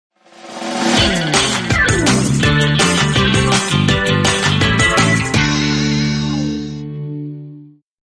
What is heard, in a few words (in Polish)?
Dzwonek Plus, Ring tones; LG KP500 Cookie